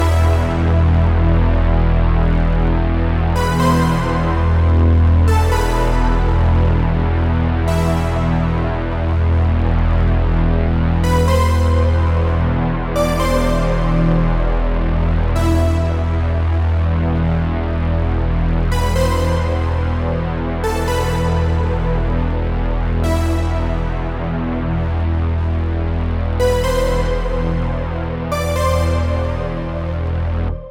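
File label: Fight Break 4x4 D major; Challenger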